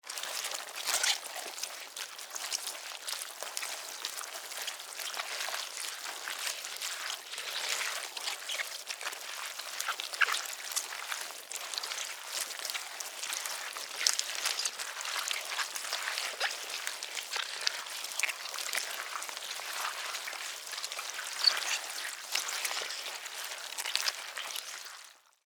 Larva Grubs Move, Animal, Larva, Grubs, Movement, Juicy, Wet, Slimy, Insect, Bug